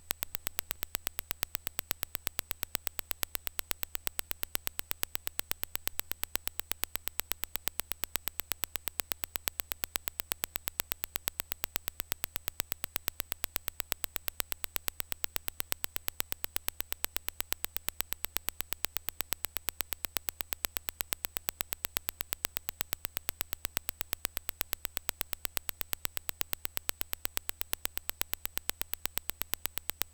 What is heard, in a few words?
DIRAC impulse